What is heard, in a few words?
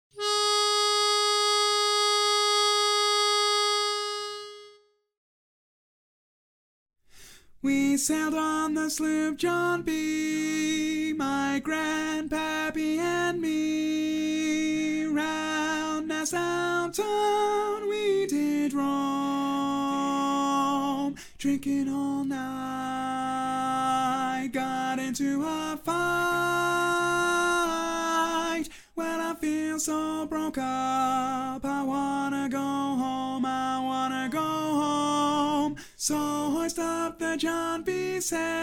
Tenor predom